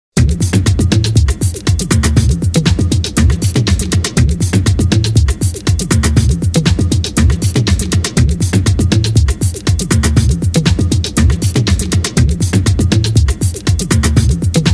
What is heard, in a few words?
Movie Loop; Soundzabound